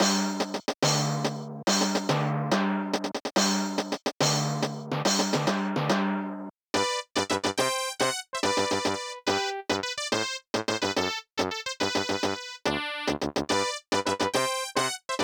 March

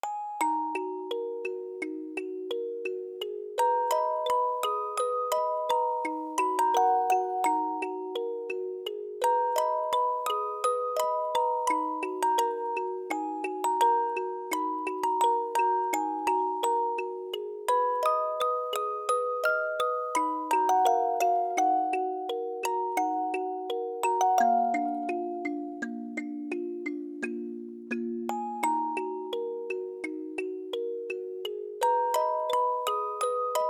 Music Box

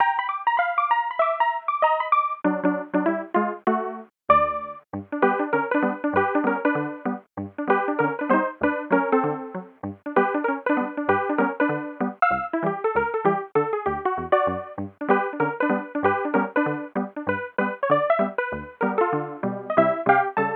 Ragtime, Aircheck, Phono

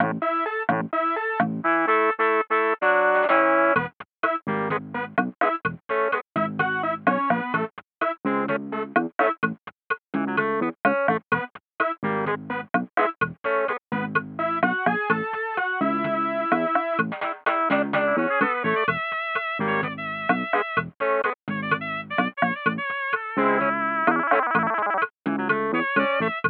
Mambo, Aircheck, Phono

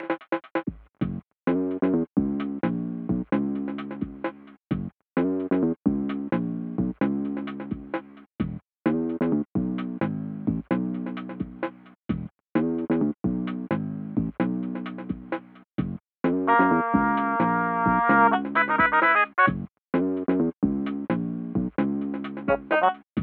Funk, Aircheck, Phono

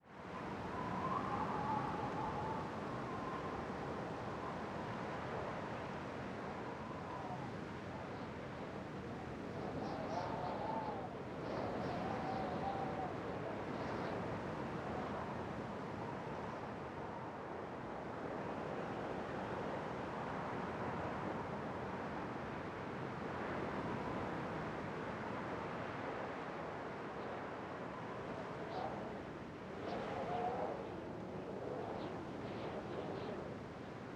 Wind Soundscape

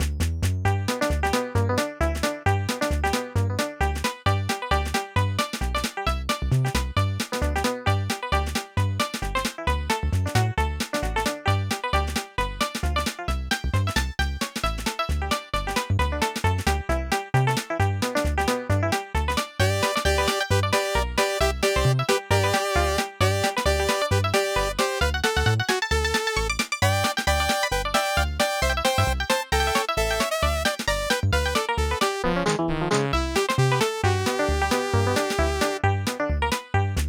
Country, Aircheck, Retro

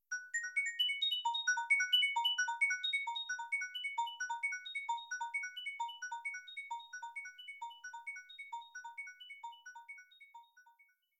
Connecting...

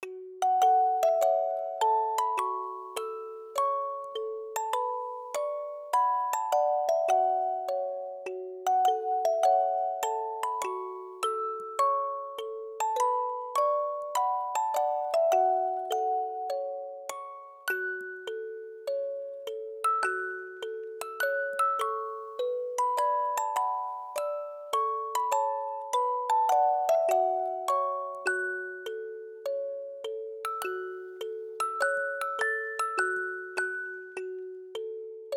Music Box

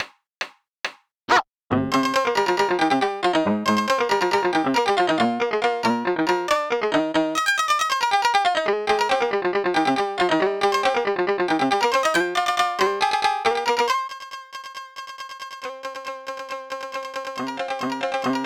Aircheck, Retro

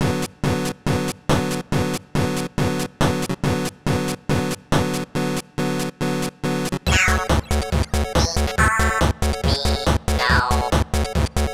Jazz, NES Remix

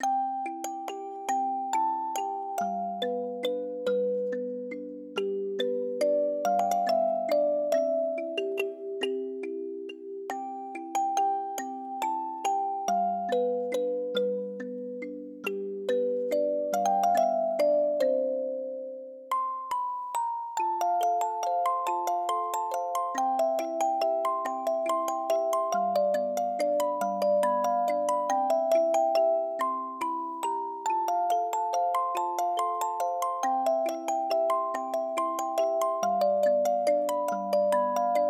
Music Box

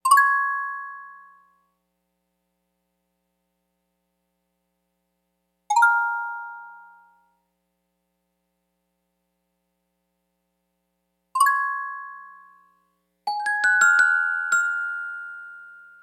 12 A.M. Rainy

